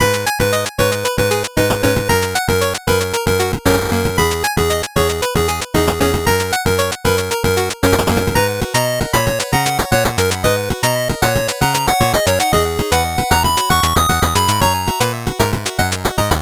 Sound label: 8bit bgm slow